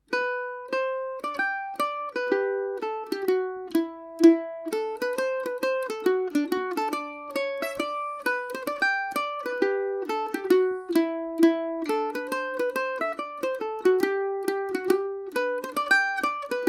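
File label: G major paris swing, irish mandolin; kitty sean cunninghams barndance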